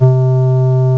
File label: clar